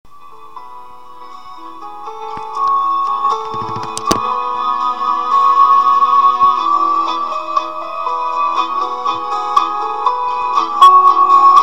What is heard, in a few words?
efferv pipe